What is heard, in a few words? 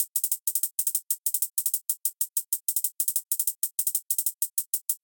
Hip Hop Hi Hat